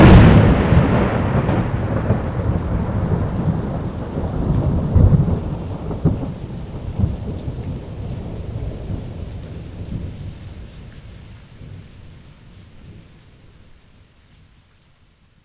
thunder strike

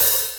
Hat Open; osu

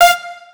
Leed Melody

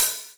hat finish

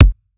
Drum Kick

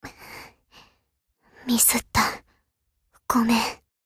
fail sound

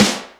drum hit clap

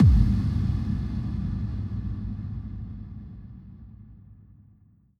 Imp

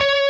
prebend full Left-hand vibrato

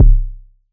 kick

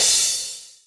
cymbal; osu

